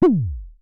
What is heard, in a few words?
Synare Filter; Star Instruments Synare 3